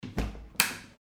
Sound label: door snap